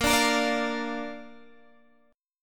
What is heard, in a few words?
A sharp Major, Guitar, Standard; strummed; x,13,12,15,15,13; chord; JGuitar